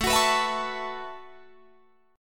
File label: A 6th Add 9th, Guitar, Drop D